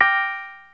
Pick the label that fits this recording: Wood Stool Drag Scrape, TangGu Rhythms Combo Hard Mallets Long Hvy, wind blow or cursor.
cursor